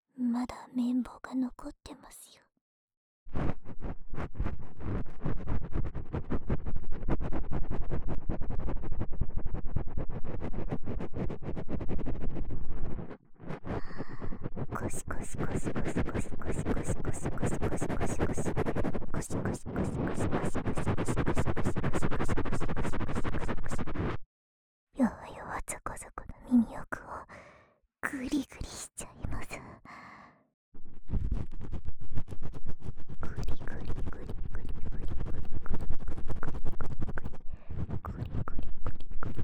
【右】綿棒高速, ASMR

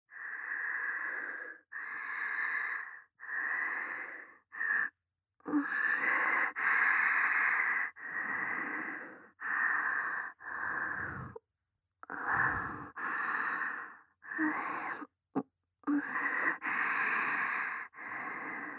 耳元・事後・吐息

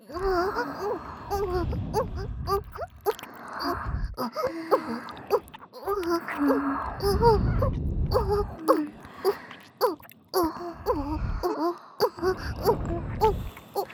オホ喘ぎ ＋ 両耳 キス 舐め; おまけループ（オナサポ）; 3WAYループ; SE無

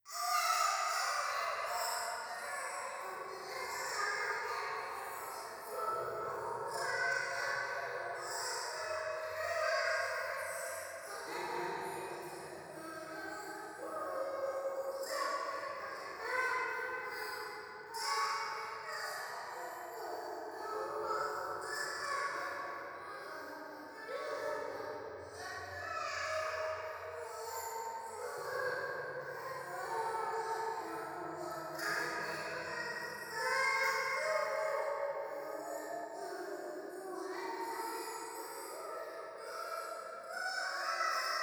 廃墟 シャブ喘ぎ

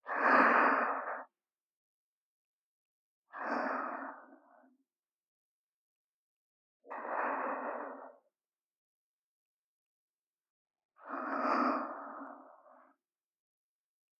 寝息(左); 穹; ループ; SEあり

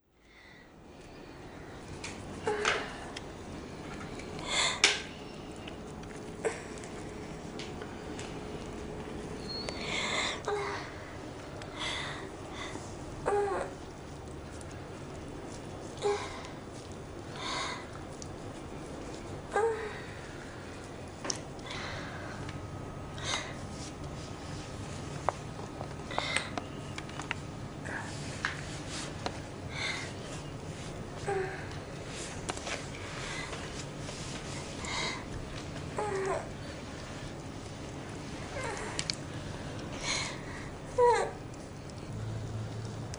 ヌルッヌルになって喘ぐ美少女マンコちゃんの喘ぎ声

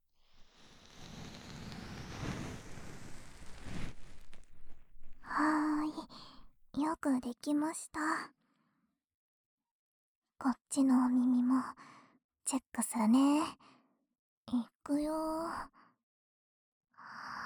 【左】浅め耳かき, ASMR; 耳かき小分けトラック

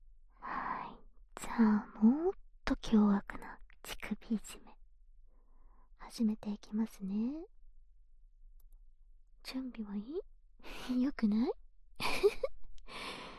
耳舐め＋乳首舐め; 耳舐め＋乳首舐めで追い詰めちゃえ！

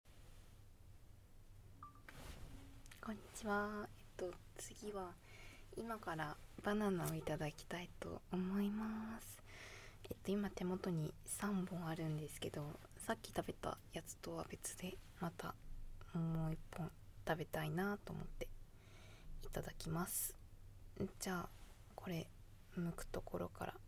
バナナ咀嚼; 七海環ちゃん

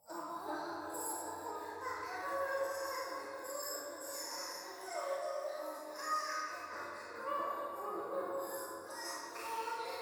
教会 低音喘ぎ

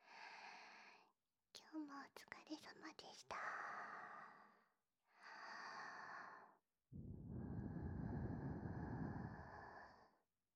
両耳ローション 耳舐め＆耳ふー

